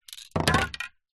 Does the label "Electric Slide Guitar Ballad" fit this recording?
No